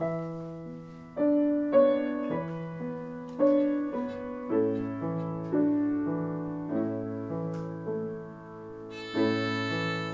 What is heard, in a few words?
violin; Long, Long Ago - Shinichi Suzuki; recital on April 5, 2014 in Marlborough, MA